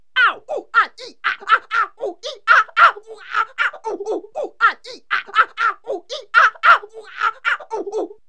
Falling Down Stairs Yelling, Cartoon, Yells; ABOOM! - Wild and Wacky Sound Software; 1,000 Sounds (16-bit)

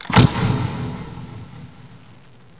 LIGHT TURN; CHASM

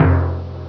(G#6) Timpani F; X-Wing; X-Wing Patches

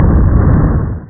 EXPL; Best of Select, The - Games 15 (1997); boom

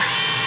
scroll up; starmerc

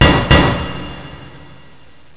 pipe bang

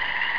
cricket

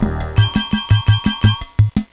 Game Flourish2; CYBER2

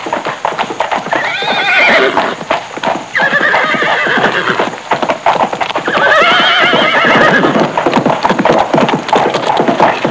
horse neighing and trotting; Sound Effects for Multimedia - Volume 1

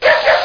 BARK

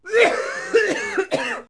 coughs; bloopers

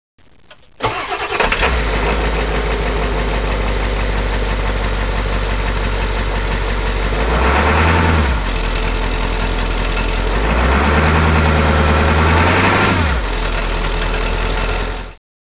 diesel start

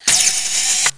flip mix, weapons srifle open; SiN

